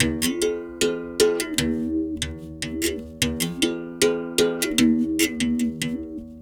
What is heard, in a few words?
Tar, World Percussion